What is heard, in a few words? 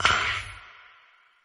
"ah"